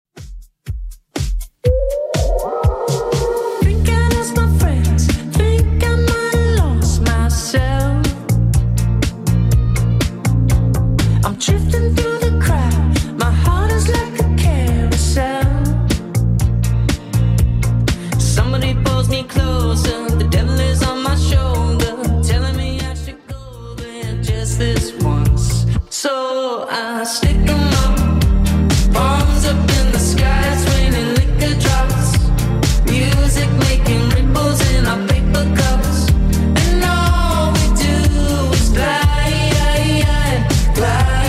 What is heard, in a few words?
Top40 Redrum 122bpm